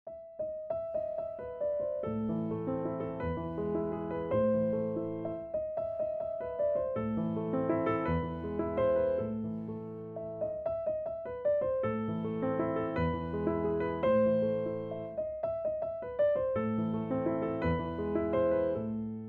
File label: Right Accents, piano; Fur Elise